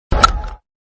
Ball Hitting Bat Sound Effects

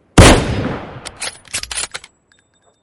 Kar98 Reload Sound

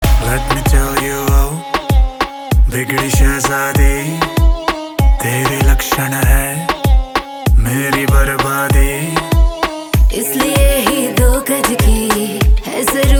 Ringtone Instrumental

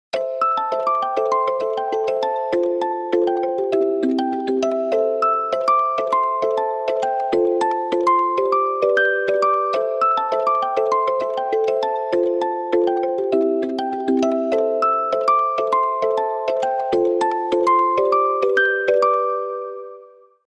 huawei ringtones

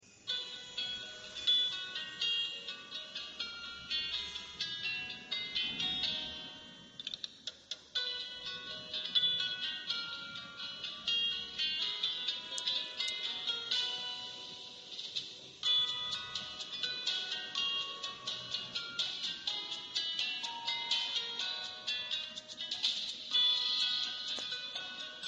oppo a77

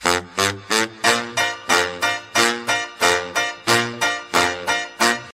jazzy rhythm

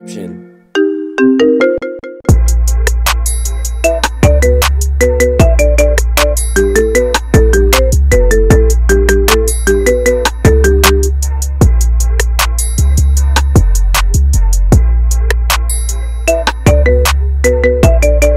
marimba remix

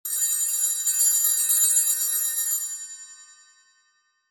schools ring

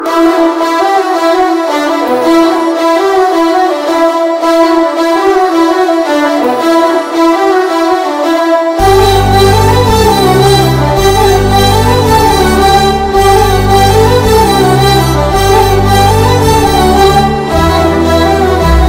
BGM Ringtone; Chal Mohan Ranga; Nithiin Megha Akash

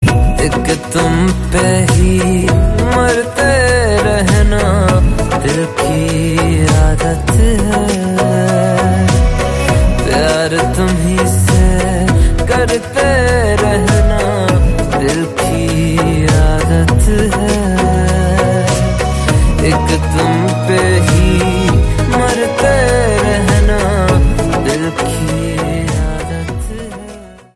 ringtone

